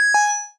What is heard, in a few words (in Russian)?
pilikane starogo mobilnogo telefona